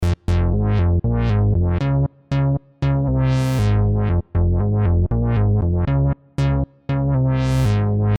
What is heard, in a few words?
house bass solo